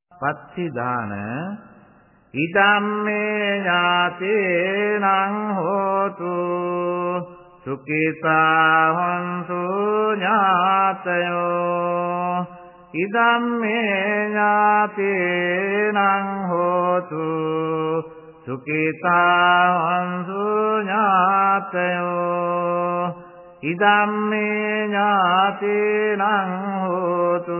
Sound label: chant, Buddhist Chanting